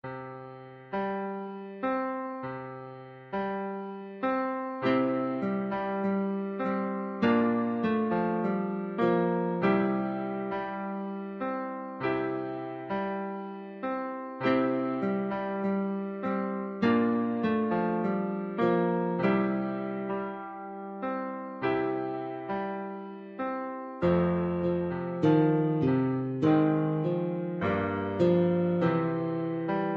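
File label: ghost town guitar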